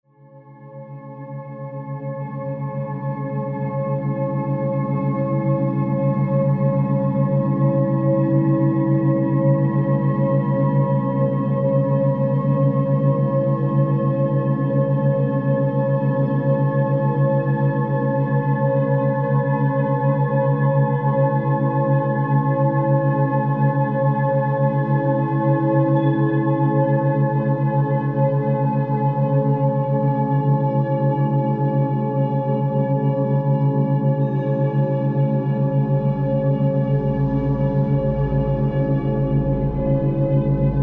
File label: Solfeggio sound healing